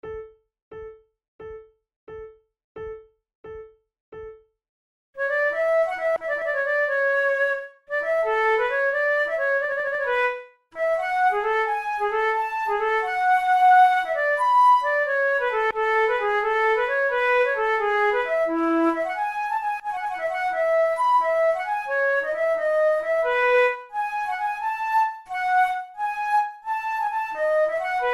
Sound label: sonatas for two flutes, siciliana